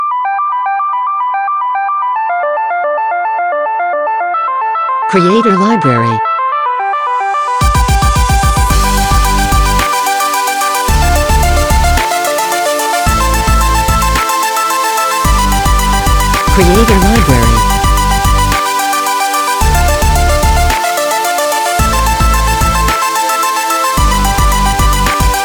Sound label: Future Technology Ident; Logo-Idents